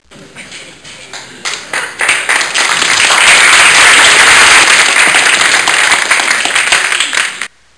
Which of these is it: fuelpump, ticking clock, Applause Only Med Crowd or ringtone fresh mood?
Applause Only Med Crowd